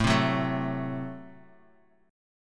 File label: A Minor 6th A Guitar Half Step Down